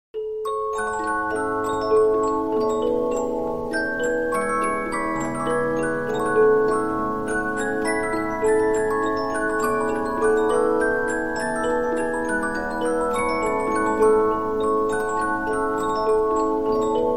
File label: Polyphon, musicbox; 15inch; Polyphon-XXXX-AchErnst